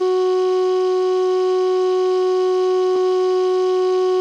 CALLIOPE F#2; Sonic Reality; American Heartland

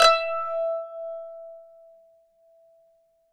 kanun PCM E5; SoundBytes; Early Patches; KAN CHOR BIG

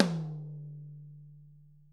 ROTOTOMS; Sonic Reality

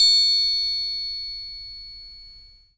TRIANGLE

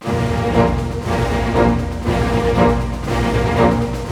SNAR, Snare Army; East West - Scoring Tools

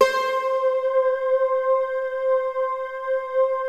NYLO C5; Music Store - Claudius Diemeter Schwerlos

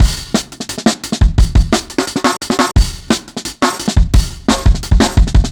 Brown Filter 4 Bar, Drum n Bass, Beats; KJ Sawka; Drum n Bass & Beyond

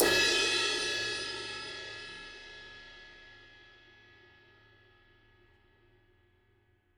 crash

